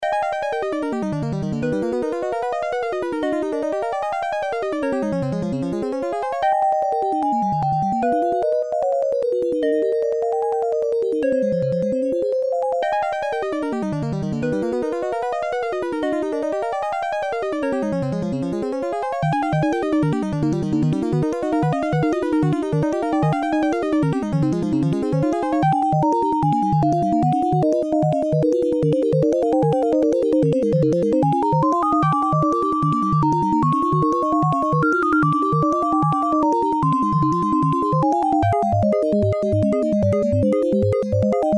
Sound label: Sega Mega Drive Underwater; Fantastic Dizzy; yolkfolk; game music